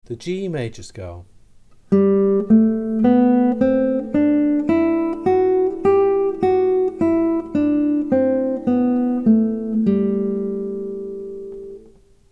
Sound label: G Major Scale